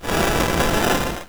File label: weapon laser; spacetac